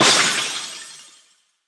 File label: Glass Crystal Shatter, 8 and 16-bit Video Game SFX; Impact Soundworks; Super FX